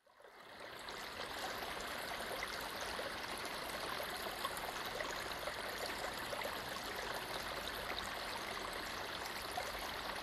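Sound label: water sound; mizuoto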